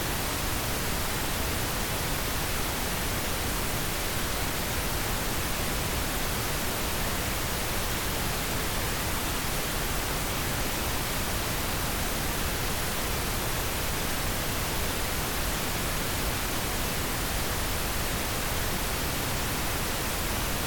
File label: PINK NOISE